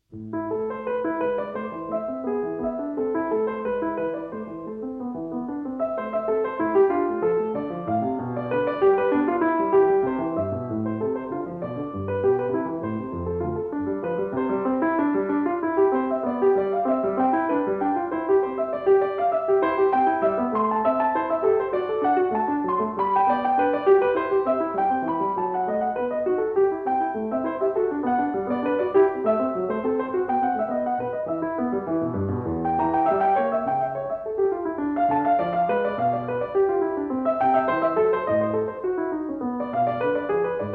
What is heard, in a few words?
Invention In A; 15 Inventions; J.S. Bach